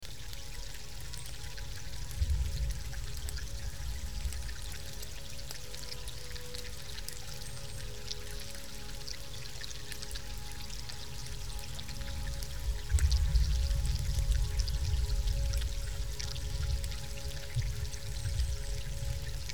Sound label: Creek Rain Medley